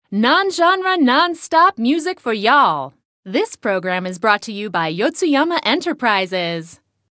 Radio Jingle; let it die; OBS

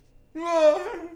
argh

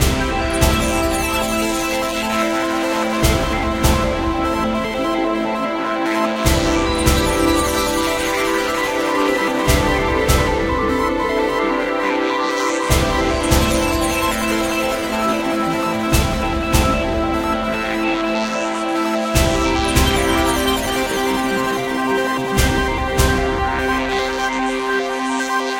Newsreel Loop; Atomic Heist; windows; xbox one; Futureworld

